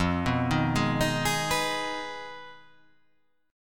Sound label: F Minor 7th Flat 5th F down